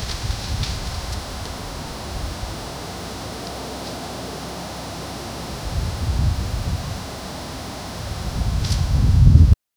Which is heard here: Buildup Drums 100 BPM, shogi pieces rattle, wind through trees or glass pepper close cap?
wind through trees